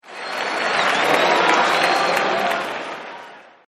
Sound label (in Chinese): 冷淡的拍手; 战中; 战斗音效; nba